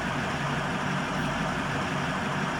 idle, vehicle; car old

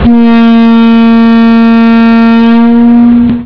m62 tifon; vehicle

